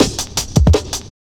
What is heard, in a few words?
Drum Loops, Jungle